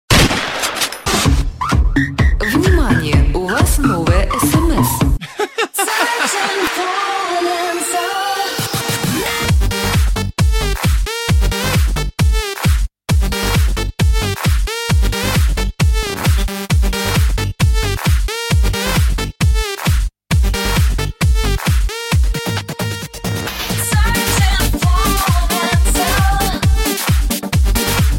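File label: sms remix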